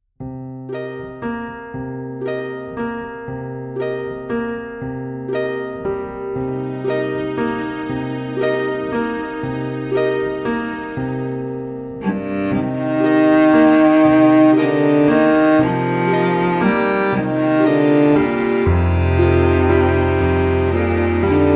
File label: rain; Umineko BGM